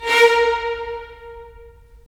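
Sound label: strings violins; Roland - LA Composer vol. 4; Violins Sh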